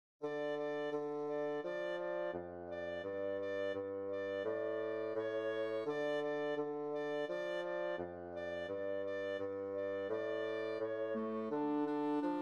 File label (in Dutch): Klarinet; Armoede